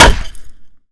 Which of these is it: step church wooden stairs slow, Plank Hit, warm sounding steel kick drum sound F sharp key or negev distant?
Plank Hit